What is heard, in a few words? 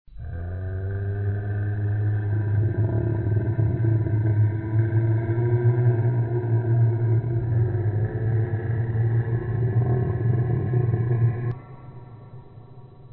zombie call, ambience; cstrike